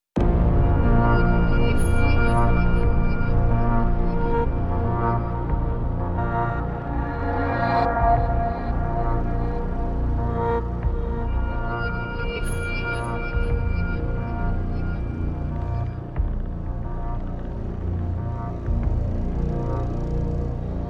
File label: Ambient